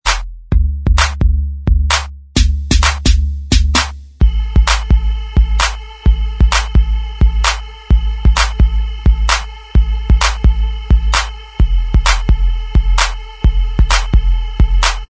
Inst

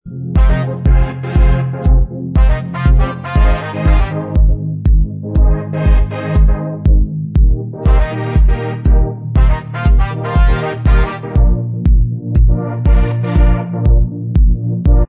Inst Track

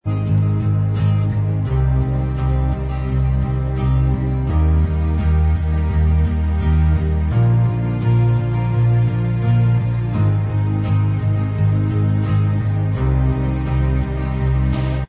Inst Track

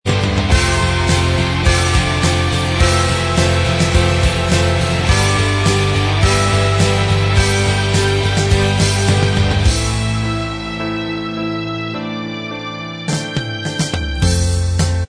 Inst

